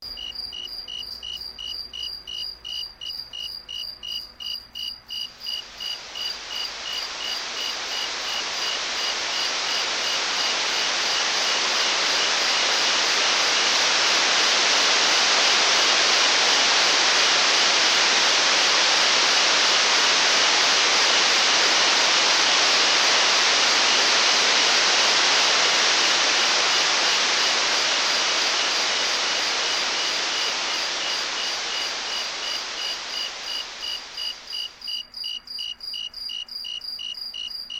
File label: lower pitched crickets and masking sound